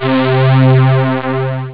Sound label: String; SynthSamples2